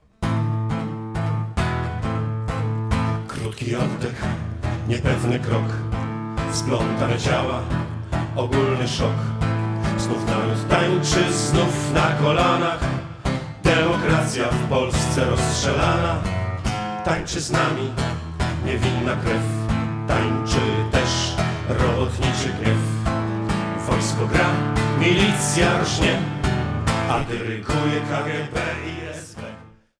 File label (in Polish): tango